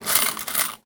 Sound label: Foley